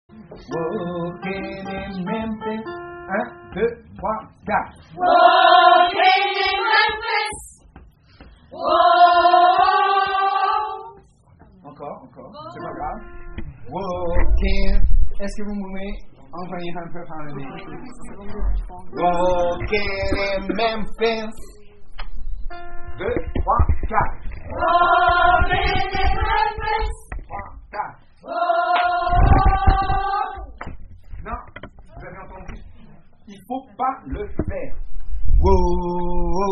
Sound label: Soprano